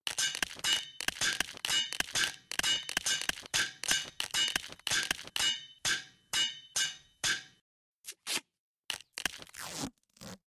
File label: 2x4 Crafting; Scrap Weapons; Zomboid